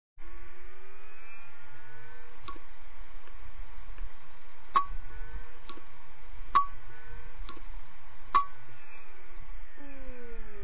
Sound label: wd 2500js slow click spin down